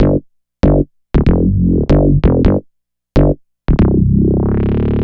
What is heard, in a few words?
Cutter Bass; Basses; Dr. Fink's Funk Factory